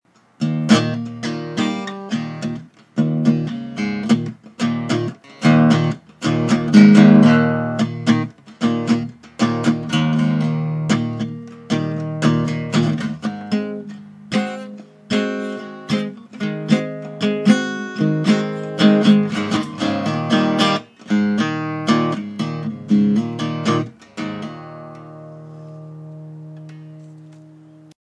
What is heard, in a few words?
gibson l 4